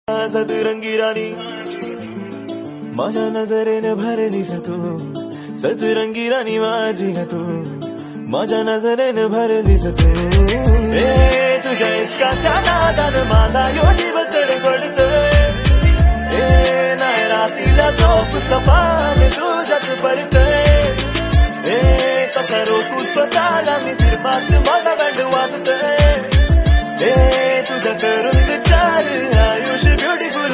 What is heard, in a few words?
Marathi Song Ringtone